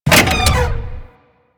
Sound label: Thumper Bot Leg Damaged C; Warframe; Grineer